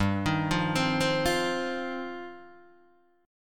G 7th Suspended 4th Sharp 5th G down Guitar Open D